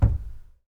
Cabinet Door Thump

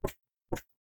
Foot Steps

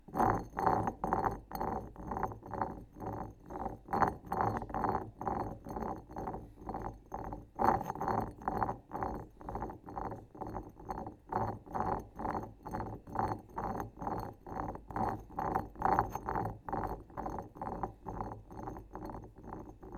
Marble Herb Grinder Roll; Rossjuterbock

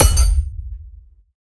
Kill Sound Impact

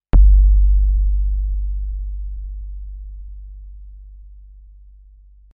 Mega Deep Hit

